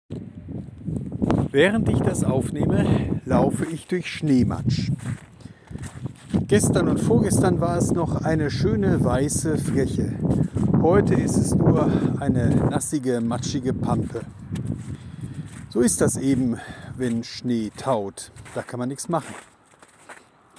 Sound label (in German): matsch und schnee